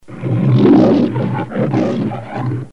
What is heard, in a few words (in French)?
lion cri, cris d animaux